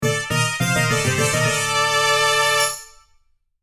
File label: Spanish transition accent ole cartoon, guitar